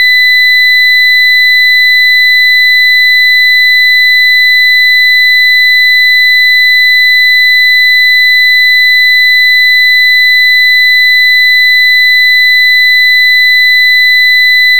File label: Ringbell Answers; 音MAD; 蓝蓝路